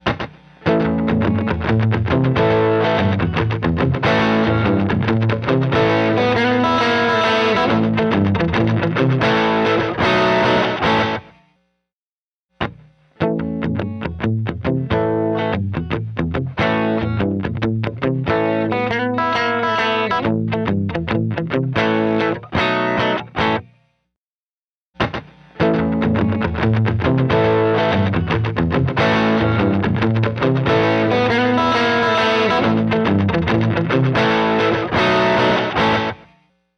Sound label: Guitar Slap Drive; H90 Programs